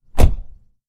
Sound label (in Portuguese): porta fechando